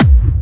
Bassdrums Clubby Kicks; Vengeance Samples

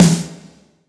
Claps & Snares

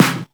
Snare; Vengeance Samples

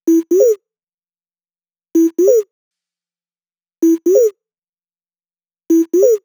128BPM Kit; VFH1 128BPM Roboto Kit

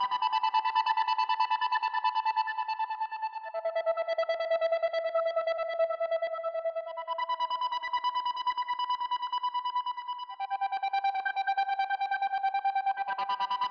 Whistle Synth WET 140BPM, E minor; Melody & Synth; Vengeance Samples

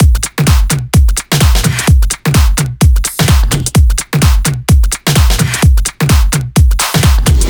128BPM Big Floor Groove Kit; Vengeance Samples

